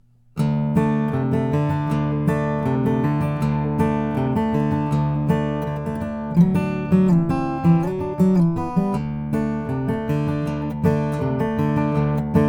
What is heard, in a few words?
skytop gc tunnel 14 redwood top